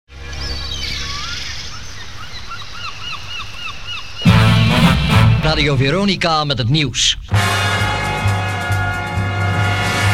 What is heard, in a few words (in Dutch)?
Jingle met het nieuws; Radio Veronica